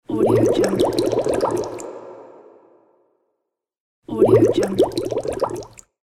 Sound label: sudden water bubbles